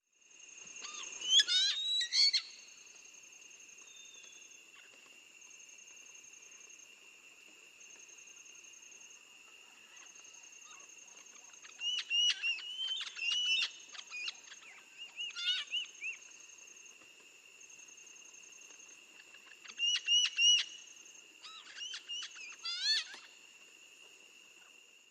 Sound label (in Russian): veernyi popugai; deroptyus accipitrinus